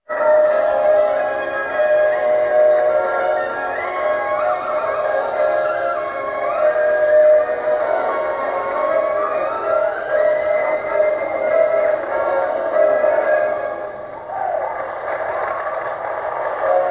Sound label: circus high notes